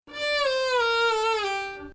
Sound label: Bayati Samai Nahawand; Samai Bayati il-3ariyan; Nahawand 4